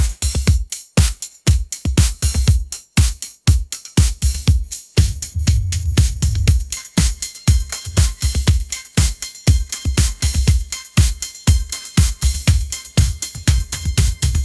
bright lights pop anthem

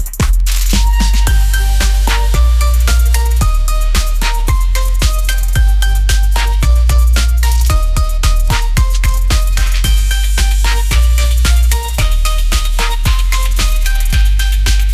bouncy pop energy, ringtones

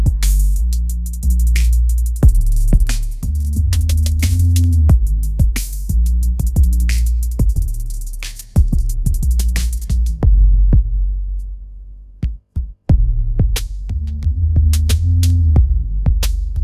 midnight trap romance, ringtones

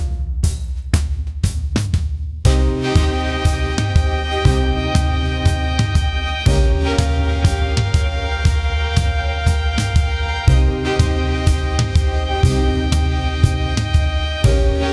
cinematic jazz rising tension

